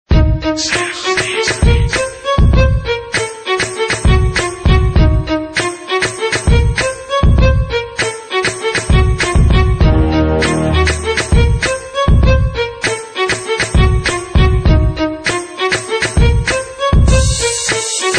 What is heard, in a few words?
hip hop arabic ringtone